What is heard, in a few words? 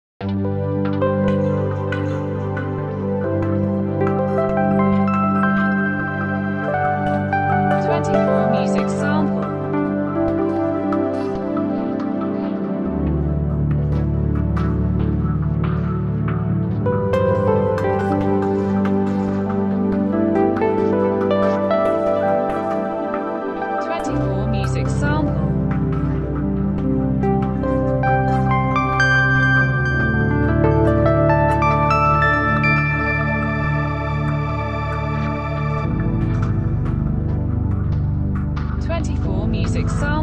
synth